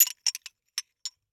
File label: Test Tube Grab Multiple; Medical; Blastwave